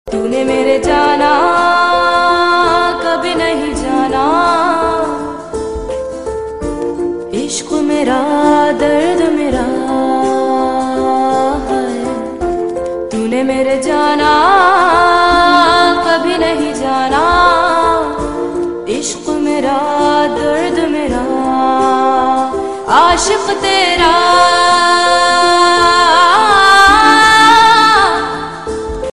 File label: Female Ringtone